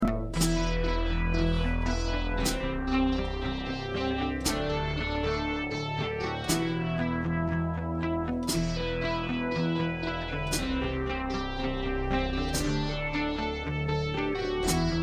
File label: keys seagull dw8000